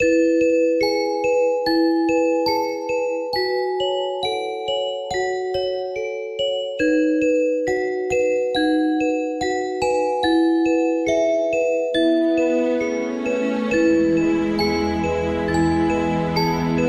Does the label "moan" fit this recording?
No